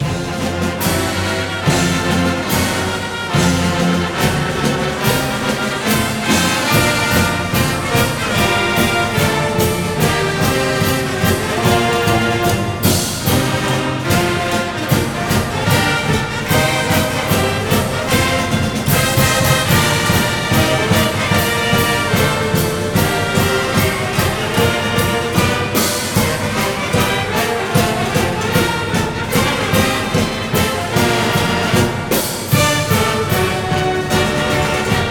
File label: Fight Song; NCAA Basketball 09; PS2, PS3, Xbox 360